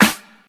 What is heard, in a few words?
focused steel snare drum sound g sharp key